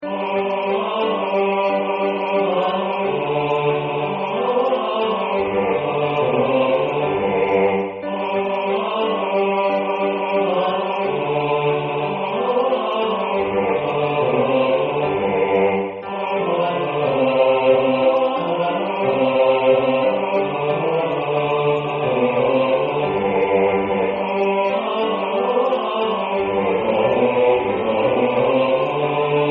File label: Bas; Sing we and chant it